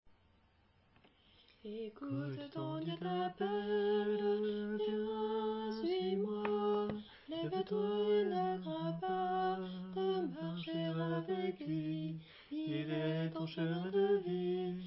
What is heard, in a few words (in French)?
Voix de soprane femme; écoute ton Dieu